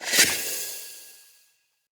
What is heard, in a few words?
stag blinding light enemy blind start; Powers; Stag Pact